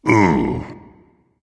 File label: ugh dragon; fafnir